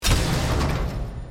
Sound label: succeed